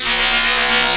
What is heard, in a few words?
analyze weakness beam; Earth and Beyond; EA Games